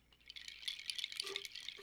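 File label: Music Village Calm Near; From Dust; PS3; Xbox 360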